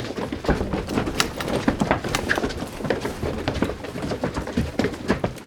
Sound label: chaotic footstep indoor